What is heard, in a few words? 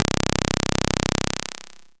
Basic Impulse Wave C1